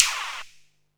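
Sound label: MISC Right, Techno 138 BPM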